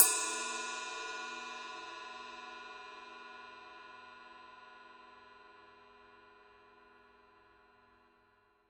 power ride body, Paiste 20" Rude Power Ride Cymbal; ride cymbals